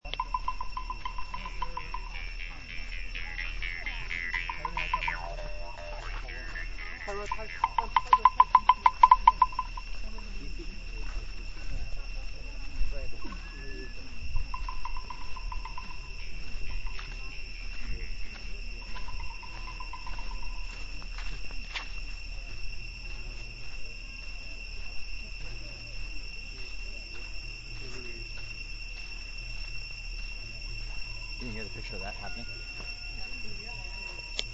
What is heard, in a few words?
Cicadas And Music Touts